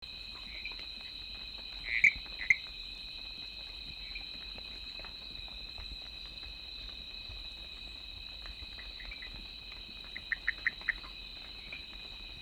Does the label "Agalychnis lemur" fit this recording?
Yes